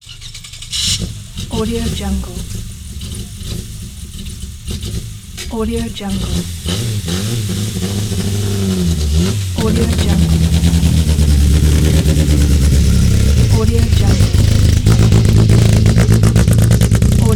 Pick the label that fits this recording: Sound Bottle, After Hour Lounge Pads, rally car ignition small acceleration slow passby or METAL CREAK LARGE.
rally car ignition small acceleration slow passby